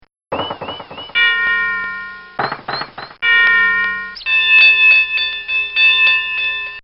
Cartoon Sound Effects Bongs; Cartoon Sound Design

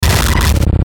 Hit Static Shine Stutter; Blastwave

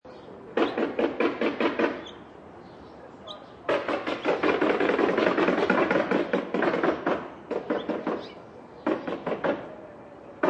Contrct Hmr Saw

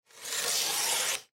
Paper Cutter Cut Paper; Office; Blastwave

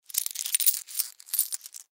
Coin Handle Noise Short Multiple; Money; Foley

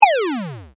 Power Off Synth, Scifi, Descend, Square, Wave; Science, Fiction; Spacecraft; BlastWave

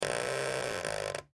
Wood Creak Squeak; Wood Sound Effects; Materials; Foley

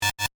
Glitch Beep; Glitches; Sound Design

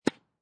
Tennis Hit Ball with Racket Medium; Lynne Music

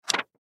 Flash Card Flip; Paper (Sound Effects:Materials); Blastwave; Foley

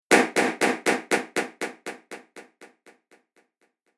Bang Sound With Delay; Impact / Explosion Sound Effect; Bang Sound Effects

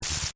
Electric Discharge, Magnetic, Science Fiction

